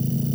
menu entry select

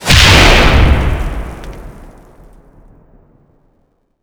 rocket explode

